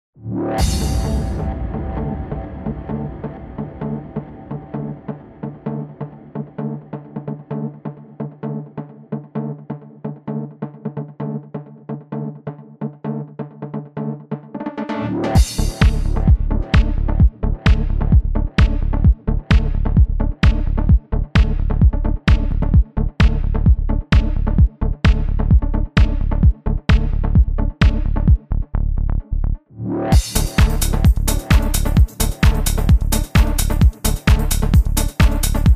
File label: Trance; SAMPLETANK